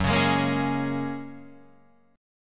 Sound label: F sharp Major Add 9th, Guitar Open D